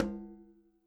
KILLER CONGA

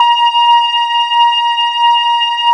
B4 SYNTH, SYNTH ORGAN; AKAI S-Series CD-ROM Sound Library VOL-7

